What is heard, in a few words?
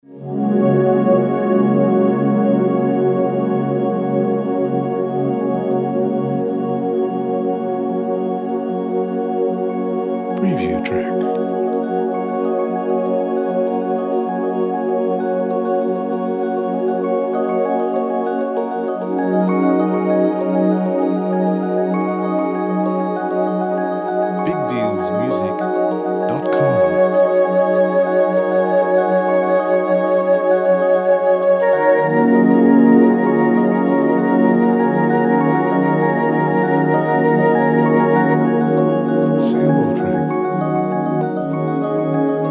Bell Pad Forest